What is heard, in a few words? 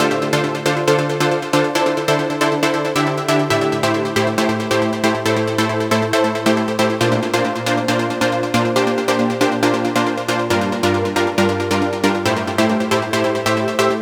137BPM Melody Main WET, Dminor; Melody & Synth; Vengeance Samples; Universe Kit